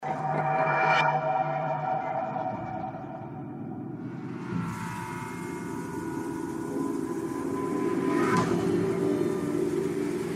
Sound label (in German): anfangssequence, Ausserhalb der Kämpfe ist die Musik ruhig und mysteriös; Titan Souls